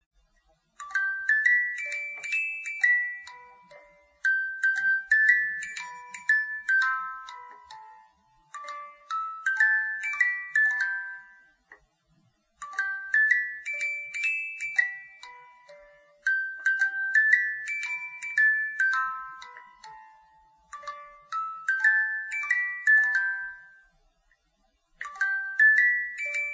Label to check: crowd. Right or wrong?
Wrong